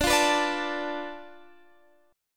F 6th Flat 5th D, chord

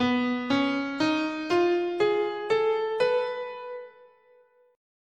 B Prometheus scale

